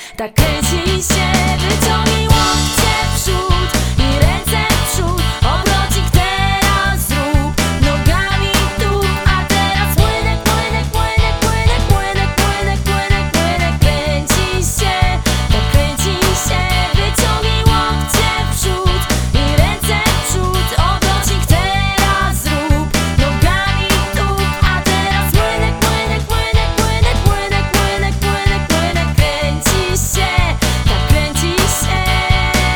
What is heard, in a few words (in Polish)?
WOKAL